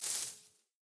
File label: Bunny Leaves